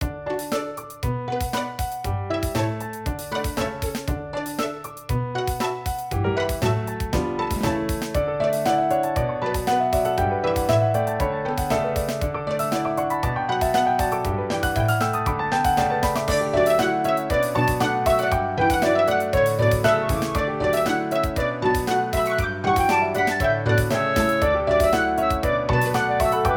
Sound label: bgm